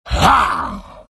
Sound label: exert; dota; beastmaster